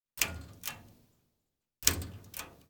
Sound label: Switches and levers Lamp Pull Switch